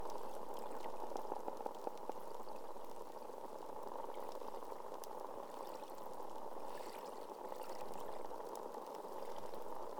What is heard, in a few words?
Off Reef Sample